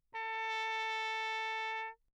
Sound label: TRP MUTE Bach Ullven Ku; Perinétventiltrompete; Mutes